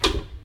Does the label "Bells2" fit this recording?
No